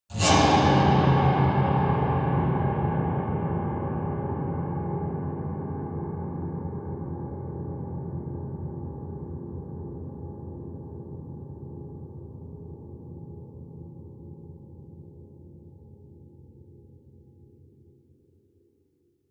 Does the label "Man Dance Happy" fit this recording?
No